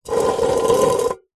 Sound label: tin metal cylinder lid on scrape on concrete; foley; zapsplat